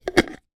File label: household jar lid put on; zapsplat